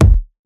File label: Perc, Percussion; FLPRO